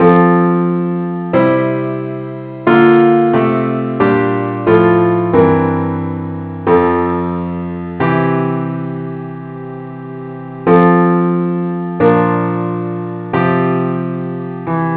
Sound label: parts only key f; gwalchmai; GLORY BE TO GOD ON HIGH